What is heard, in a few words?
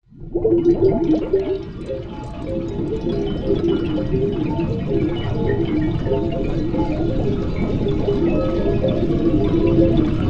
bubble jar